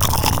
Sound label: MANTBITE; Shadow